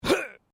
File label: MALE GRUNT; Male Vocal sounds; Grunts (Sound Effects:Voice)